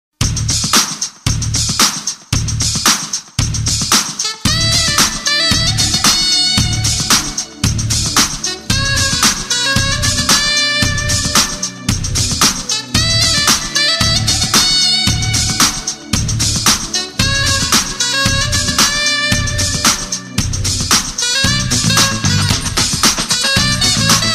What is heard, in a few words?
Interval Bang Music Bit